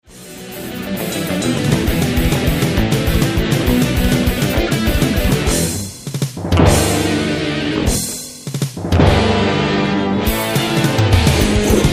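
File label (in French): electric guitar riffs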